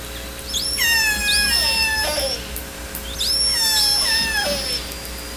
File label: call S42, Orca Calls; SRKW; biophony